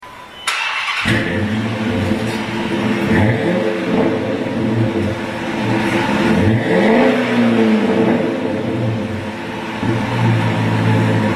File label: Charger, exhaust sounds